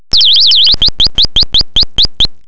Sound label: bird